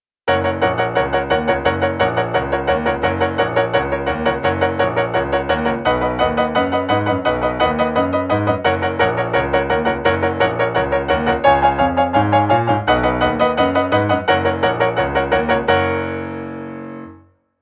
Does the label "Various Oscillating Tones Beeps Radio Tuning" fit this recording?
No